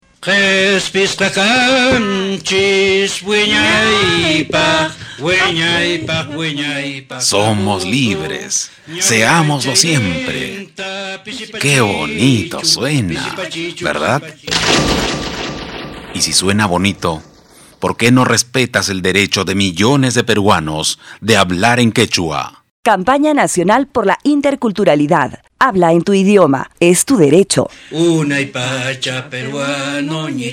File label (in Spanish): Spot Himno Quechua